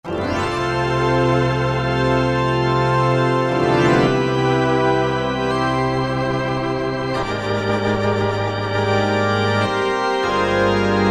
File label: Gospl, Yamaha TG33